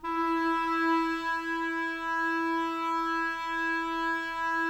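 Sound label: clarinet, Woodwinds; Sample CDs; Miroslav Vitous; Woodwind Brass Ensemble; Banks W&B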